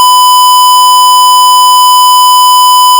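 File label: Square 1000Hz; Adobe Audition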